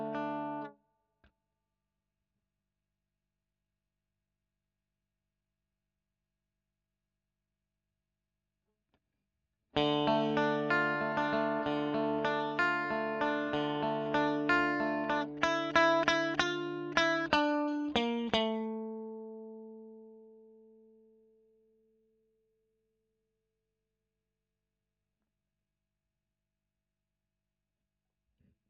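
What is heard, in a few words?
Electric Guitar; Royer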